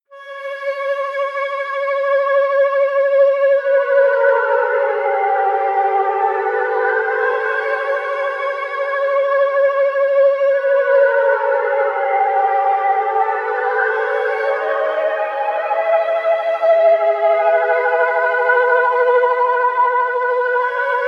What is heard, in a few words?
flute shimmering